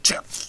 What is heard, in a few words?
spearman attack; megaglest